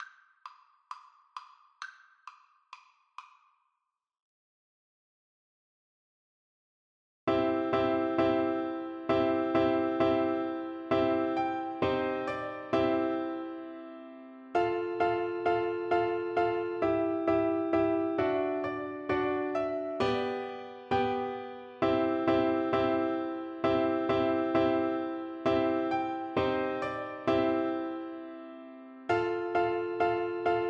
Piano Duet

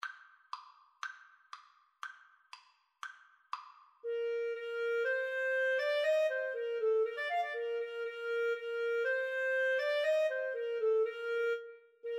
Clarinet Cello Duet

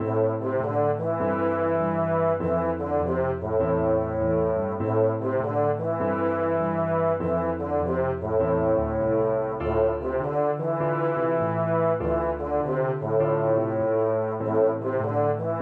euphonium

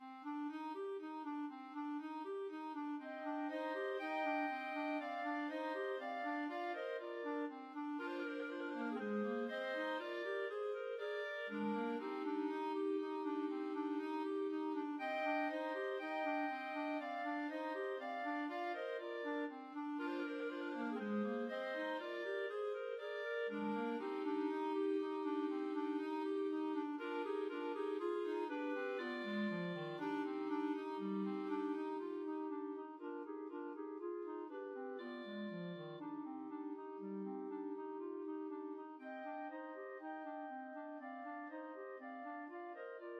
Spanish Dances clarinet trio

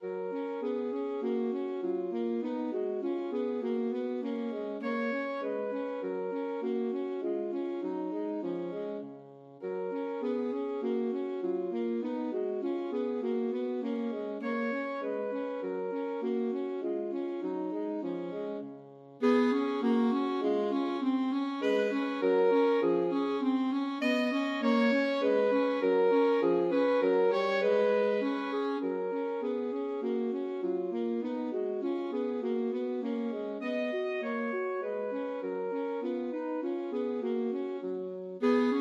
melody, alto saxophone duet

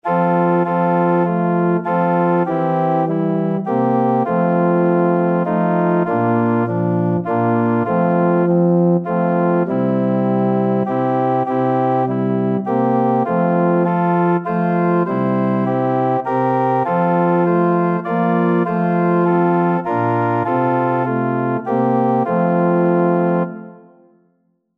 org, organ

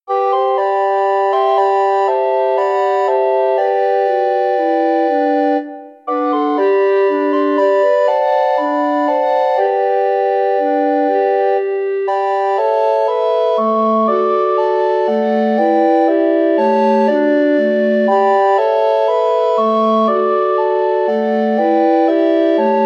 recs, recorder quartet

